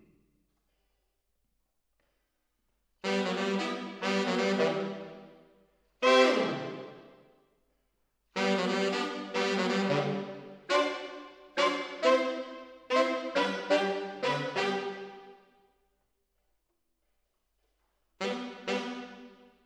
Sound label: MKH AB, piano